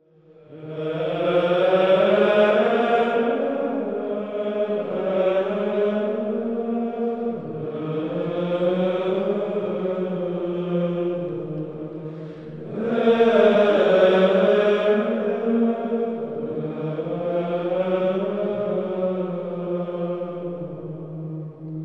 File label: tota pulchra